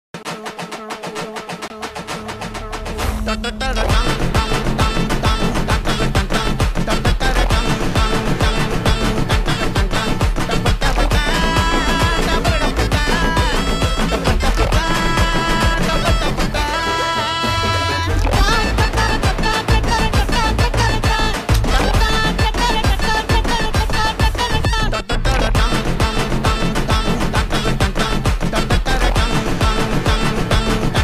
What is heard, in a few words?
ringtone; kalki; prabhas